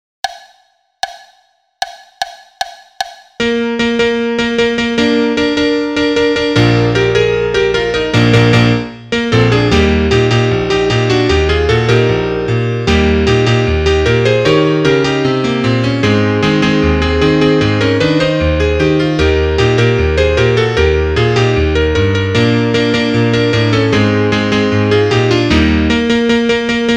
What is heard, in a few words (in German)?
Klavier Solo, trompete; Shake; (Duett)